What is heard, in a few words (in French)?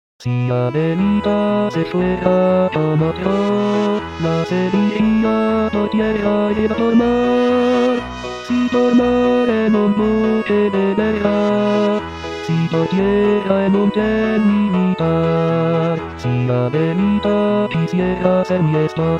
la adelita basse